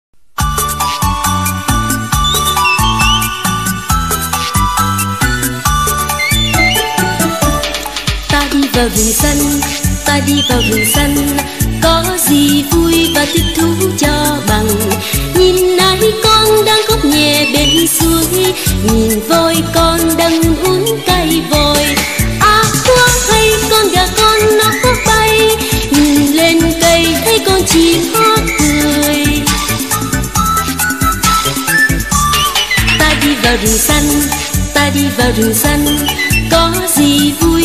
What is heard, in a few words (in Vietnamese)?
bài hát